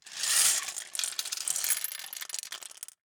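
Gumball machine, metal, glass, candy, flip, jostle, clatter, hyper; Eiravaein Works; Vaeyan IV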